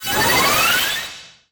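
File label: Summon Synchro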